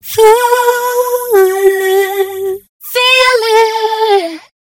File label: Stereo Flanger Female Vocals Wet Classic Flanger; Sonicircuit; Syntheway